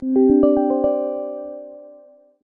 Windows Reminder

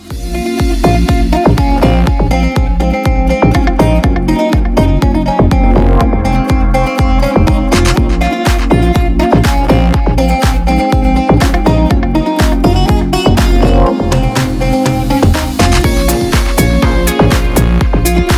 Best Guitar

